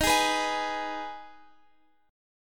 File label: D sharp Suspended 4th Sharp 5th, Guitar, Open F; x,x,15,15,14,15; strummed